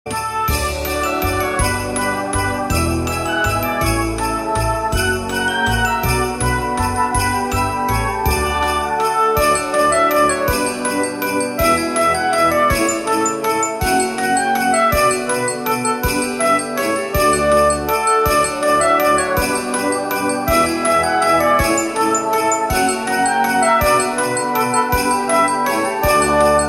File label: town center